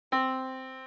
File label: yamaha Disk